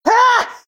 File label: hit; Henry Danger - Day Of Danger!